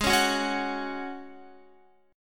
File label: G sharp 7th Suspended 4th G sharp, Guitar; G#7sus4; strummed